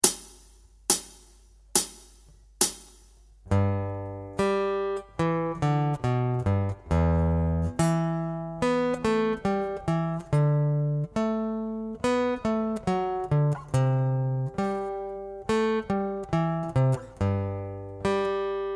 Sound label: Rhythm Riff